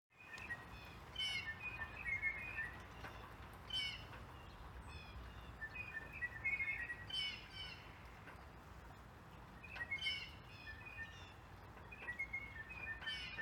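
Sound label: blue jay tweedle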